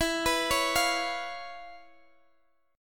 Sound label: C sharp 7th E; C#7/E; arpeggiated